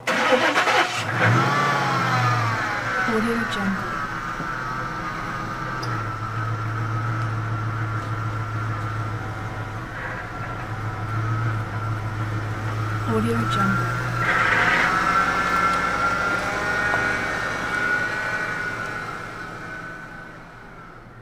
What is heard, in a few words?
auto minivan bad shape start and away gears grind